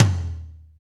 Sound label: TOM TOM, Roland